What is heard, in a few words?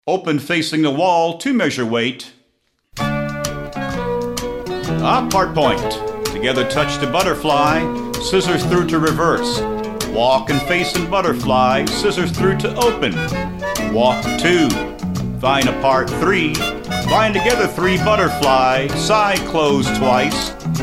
vocal sample